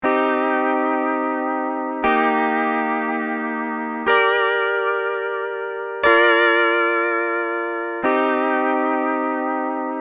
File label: lap steel; lap steel guitar; tiki instruments; chiqui audio